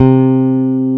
E BASS C3

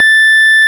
ORG Farfisa Clarinet A 5

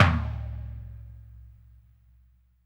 LOFI, LO-FI KITS; Spectrasonics; Burning Grooves CD2

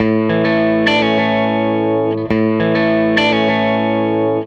Gretsch Guitar Loop; Sonic Foundry (Sony Creative Software); Six-String Orchestra

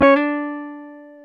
Jazz Guitar

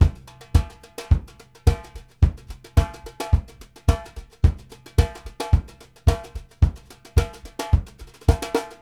4 ON D FLO, BRUSH; Sampleheads - New York City Drumworks VOL-1

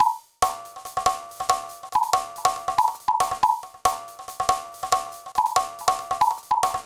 Drum Fx Loop, Electronica; Drums & Percussion; FX Kits; Sonic Foundry (Sony Creative Software) - Electronic Point Blank

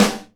SNARE DAMP, Live Snares

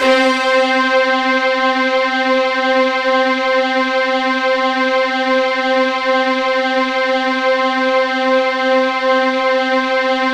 C4, synth; 90 s Sample CDs; NiceBeats; SynthLine VOL-27

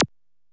drm, c#3, EMU SP 12, DRUMCOMPUTER

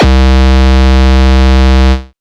C2, Synth Hits; Zero-G; Soundclash Nu Dance Electro; Acidized

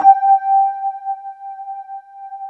WET RD G4 R, Keyboards Old School; USB Soundscan vol.09; AKAI; WET ROAD

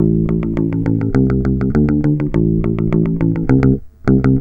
bass, Roots Funk; USB Soundscan vol.41; AKAI

